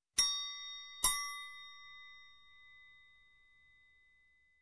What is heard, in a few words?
Bell Ring